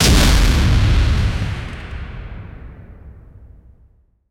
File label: blast accident sound